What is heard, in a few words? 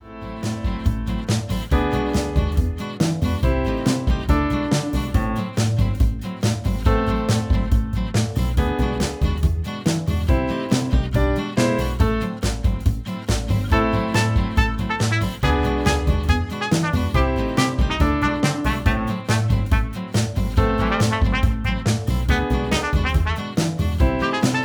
Song Loop; LocoLoco Song 3